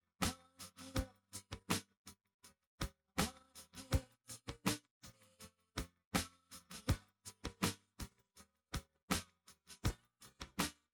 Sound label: drums; SiSEC11